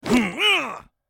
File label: Character Black Hit; Angry Birds Epic